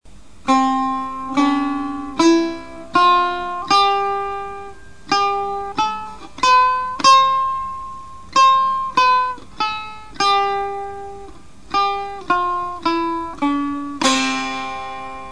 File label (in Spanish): chahargah escala, Musica persa